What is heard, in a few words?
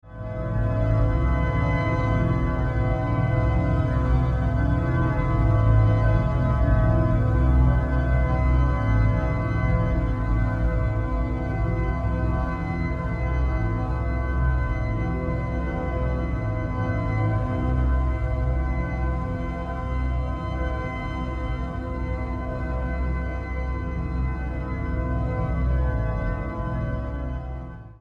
deep sleep organ; first deep sleep organ